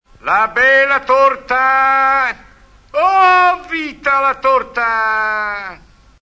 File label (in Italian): grido del fornaio